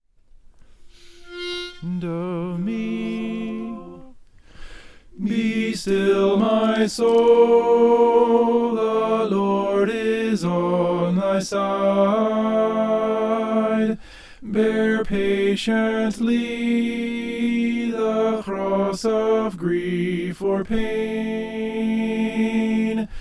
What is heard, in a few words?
soprano